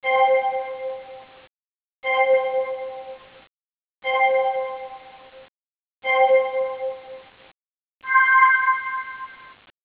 ui countdown sound, Motorola ROKR EM35; ui sounds

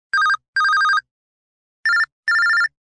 Telephone; KB775 Scarlet

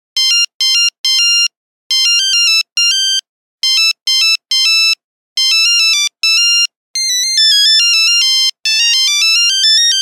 Nokia Buzzer Hat Dance; Windows Phone apps

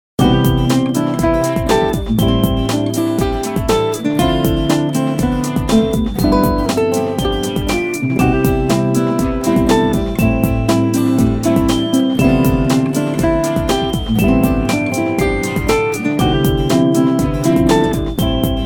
celeste; Vodafone P600; ringtones

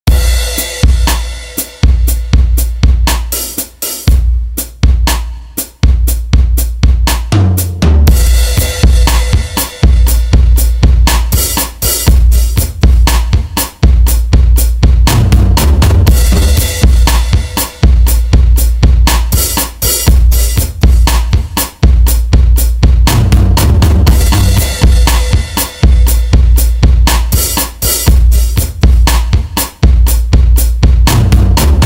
Rythmic Echo, Ringtones; Alcatel Vodafone Smart II

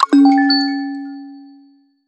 Jumping off, switch on off; Orange Rise 54

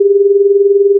400Hz x 20Hz 1000ms; Nokia Lumia-1320; Lumia 1320 Southeast Asia

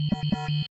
Alien; Samsung Galaxy S23

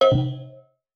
Undock

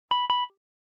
Video Record, ui

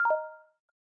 light lock; LG Leon 4G LTE

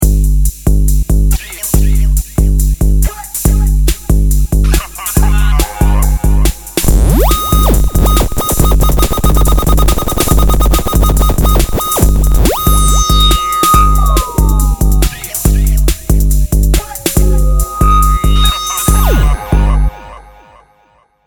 Sonic Boom, ringtones

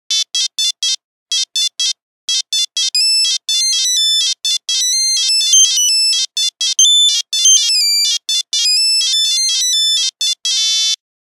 Nokia Buzzer Hurdy-gurdy; Windows Phone apps

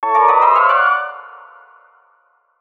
Alert; Tyrangel Alert; Sony Ericsson; PlusFourSix; Tyra (G900)

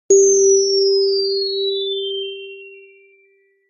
Tinkle Bell; Alcatel Vodafone Smart II

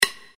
camera timer, Sony Ericsson; Z555i

